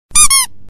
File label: Single Squeak